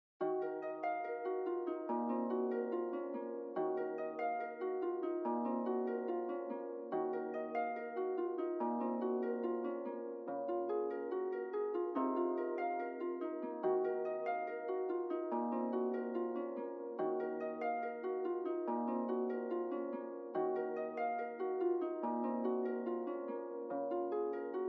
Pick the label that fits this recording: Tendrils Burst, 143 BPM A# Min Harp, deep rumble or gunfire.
143 BPM A# Min Harp